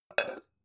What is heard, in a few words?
pan close chink soft